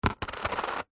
money coins x2 australian 2 dollar coins set down on plastic lid; foley; zapsplat